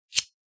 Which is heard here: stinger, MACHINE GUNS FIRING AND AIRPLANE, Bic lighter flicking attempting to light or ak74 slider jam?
Bic lighter flicking attempting to light